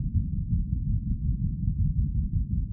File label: venice tides 40Hz HPF 200Hz LPF fadeout